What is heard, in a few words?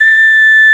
Ocarina A4; Multisamples; Digital Sound Factory Planet Earth